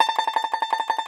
Tenor Banjo, Plucked Strings

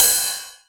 909 Ride, Drum Machines

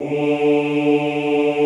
OOH D2, Classical Choir; Best Service; Extended Classical Choir

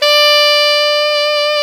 SAX ALTO MF, Alto Tube; Roland LCDP07 Super Sax

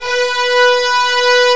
VIOL, ORCHESTRA; AKAI S6000 CD-ROM - Volume 1

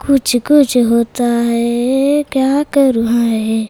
CHILD VX C, Indian Traditions

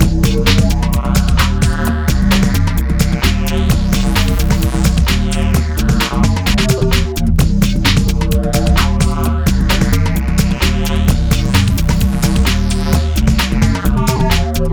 Hardcore Breakbeat, 130 Bbmin; Big Fish Audio; Hardcore Breakbeat Action

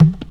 dumbek; Greytsounds; EXOTIC PERC

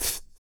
PURR PUTT, vocal; ILIO; Vocal Planet VOL-2; Blues & Soul

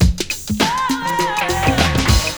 BEAT, Beats 101 bpm, Hip Hop; E-MU Formula 4000 Series Vol. 1; Hip Hop Nation

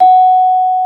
GENDER, Bronze Gamelan; E-MU Formula 4000 Series Vol. 4; Earth Tones

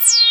HPF FX, 12-Bit Drums; E-MU Formula 4000 Series Vol. 1; Hip Hop Nation

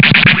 gun; ST-XX Amiga Soundtracker Sample Packs

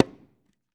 INDO DRUM, Ritual Drums; E-MU Producer Series Vol. 7 – Old World Instruments

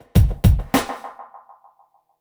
drbreak, drumbreaks; Masterbits; Vinyl Attack

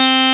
TB BASS C3

